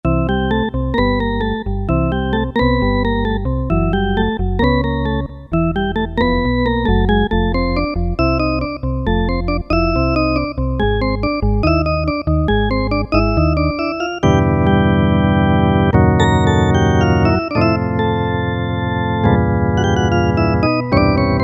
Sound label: Organ, Roland SC-50 Sound Canvas; Factory sounds